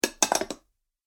Dessert Bowls Stacked Together Noise; Dishware Sound Effects; Household Sound Effect

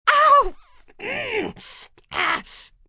Pain Near Swearin, Pain, female, toe stub; human voice

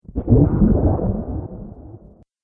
Underwater Element, Water; nature

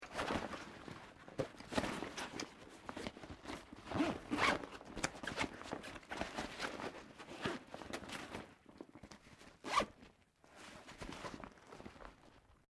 Backpack Nylon Small Movement General; common day pack; Zippers (Sound Effects:Objects)

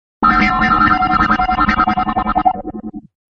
thunder sheet; Thunder Loop; Weather

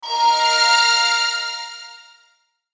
Logo Hit Bell Shimmer; Blastwave; Sonic Logos

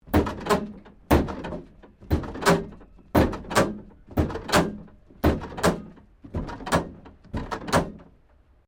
WRECK CREAK SEQUENCE, Wrecked Cars; impacts, crashes, scrapes; Crash Sound Effects; Crashes (Sound Effects:Objects)

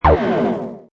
Hi Tech Panel Servo Button

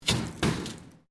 METAL SCRAPE SMALL; Sound Effects:Materials

